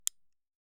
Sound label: Pistol Safety; SoundsCrate